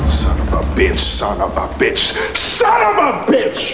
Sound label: sob